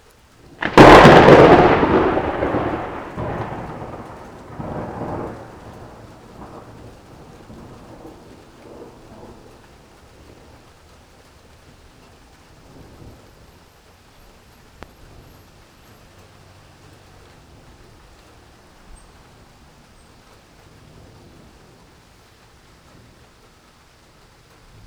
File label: thunder and rain